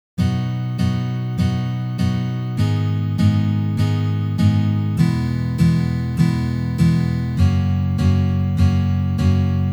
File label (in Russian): Uprazhneniya dlya igry akkorda Si mazhor na gitare